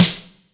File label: SN, Casio CTK-5000